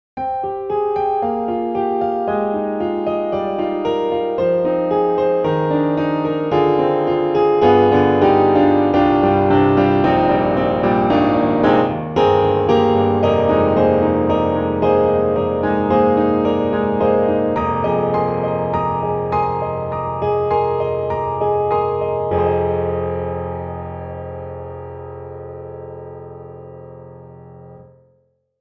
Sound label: Pianoteq Grand C2 uneven; MODARTT S.A.S.